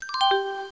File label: windows 95 chimes; cstrike; mvp tracks